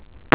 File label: wolf whistle